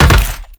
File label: Land Heavy Metal, Footsteps; Enemies; Warframe; Teshin